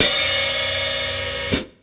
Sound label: Hat, breakcore Drum Hits